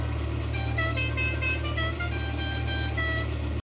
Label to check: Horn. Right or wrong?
Right